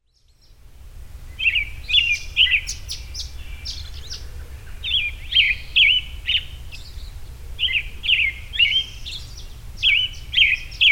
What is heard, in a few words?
Morning Birds